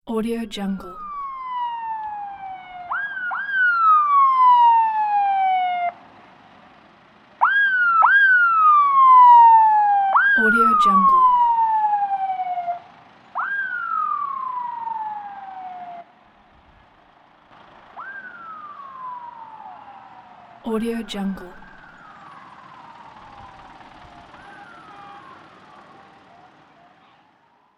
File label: ambulance